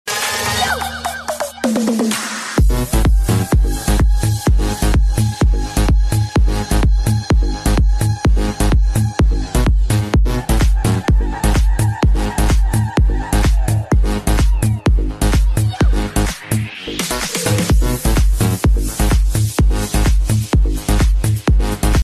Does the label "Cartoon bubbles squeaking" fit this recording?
No